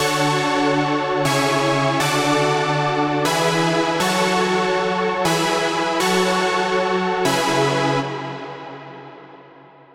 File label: Chords Full Big Room Prog House; midi wizard 2; Unison